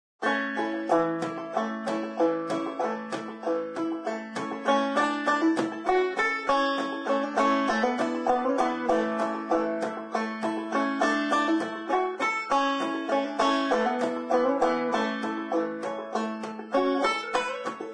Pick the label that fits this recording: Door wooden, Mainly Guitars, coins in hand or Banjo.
Banjo